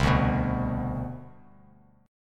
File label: C Major 7th Suspended 2nd C down, Bass Standard, chord; CM7sus2 strummed; JGuitar